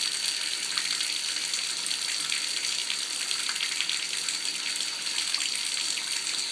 Water Splash

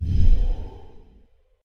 Whoosh Woow

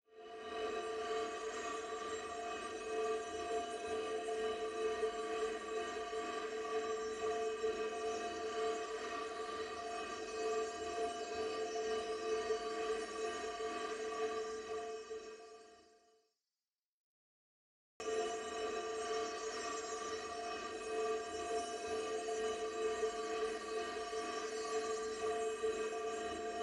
Magic Object Floating

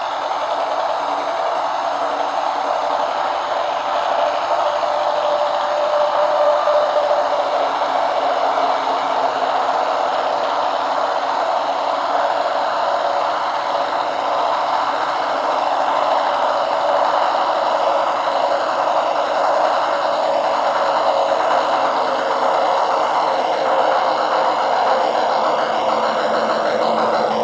Scream

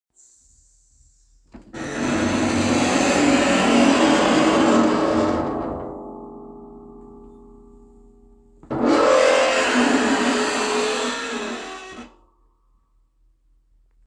Dishwasher Screech

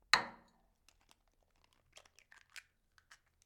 Egg Break On Glass Bowl

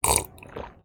Slurping Sipping And Gulp

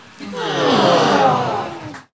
Massive Yawn Gape

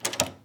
Door Handle Open

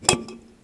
Pluck Can

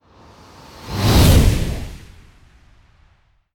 Airy Whoosh Intense

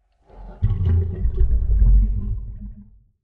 Pipe Flush Blub

